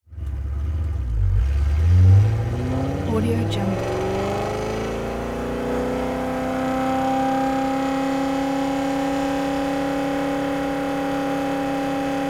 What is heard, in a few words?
porsche 928 car driving constant